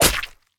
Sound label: normal Hit